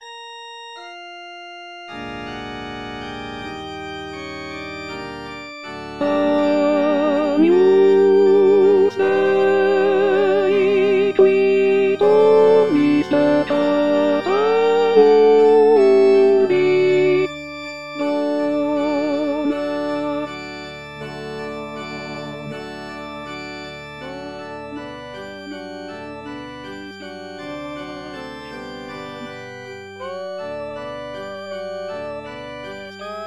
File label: agnus alto